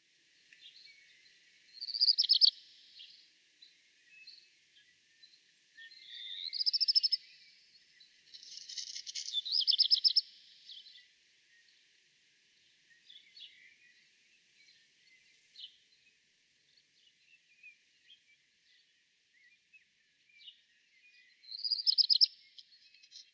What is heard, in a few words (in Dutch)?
zwarte roodstaart zang; kopciuszek; peter boesman